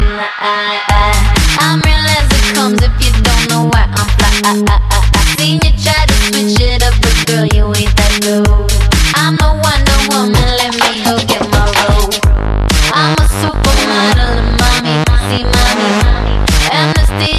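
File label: tehno, techno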